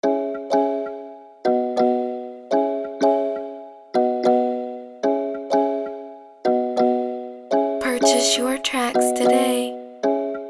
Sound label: beat 96bpm E major